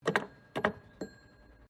Telephone Wooden Automatic Dialing Wallphone Button; Various old telephones; Electronics